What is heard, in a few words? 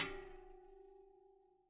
Bicycle Spoke